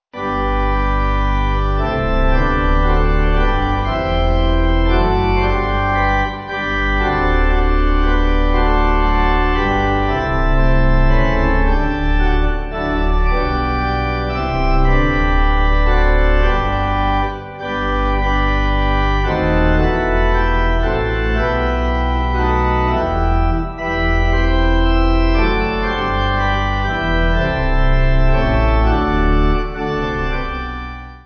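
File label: Pipe Organ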